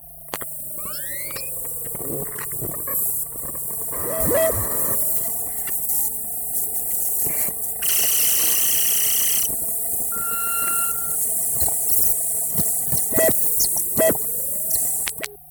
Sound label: Acer Aspire 1680 bootloop; Valentina Vuksic; Tripping through runtime for Poolloop